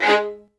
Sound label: XLD2 G#3, LOUD VIOLINS; Q Up Arts; Denny Jaeger Master Violin Library